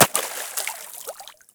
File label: Bullet Impact Water; Warframe; Weapons; Bullet Impacts